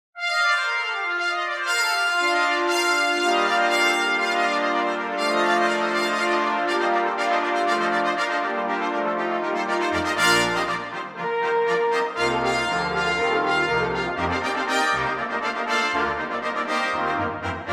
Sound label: Fanfare